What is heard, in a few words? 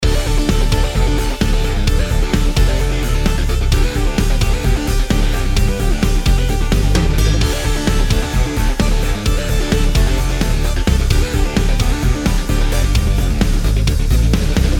Jingle stats; Cyberline Racing